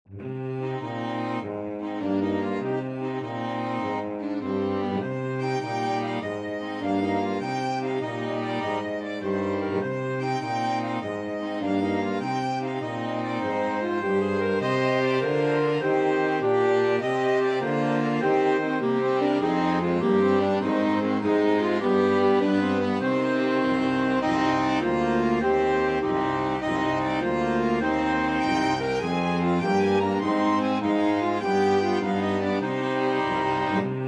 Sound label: flexible mixed ensemble, 4 players